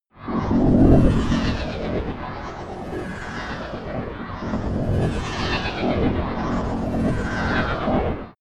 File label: passing danger